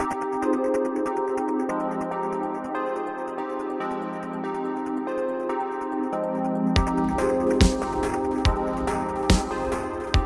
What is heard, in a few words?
muddy filter wave